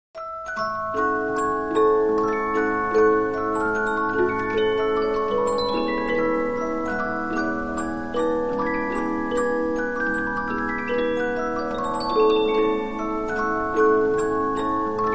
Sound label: Polyphon, 15inch disc musicbox